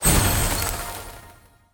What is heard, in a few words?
chest big; drift-boss